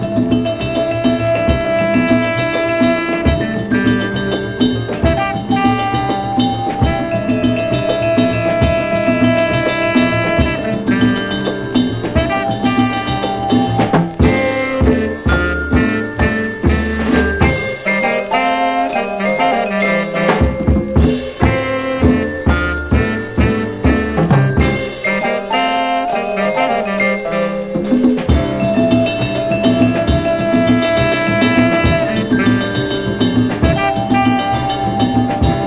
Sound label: LATIN JAZZ BLUES